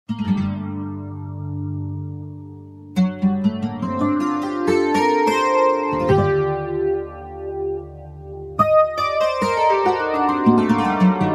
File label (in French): GUITARE SOLO